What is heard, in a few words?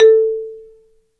Marimba; Roland LCDP11; Africa VOL-1; Af.Marimbas